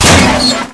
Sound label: vent open, metal; hl2; impact